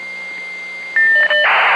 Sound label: modem connect; Sound FX Real Life